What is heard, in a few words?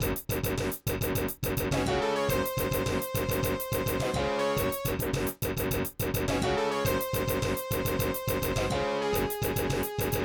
Jungle, Roland; Blake Stone Aliens of Gold; MS-DOS